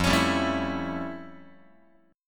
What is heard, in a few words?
F Augmented Major 9th F Guitar; F+M9; strummed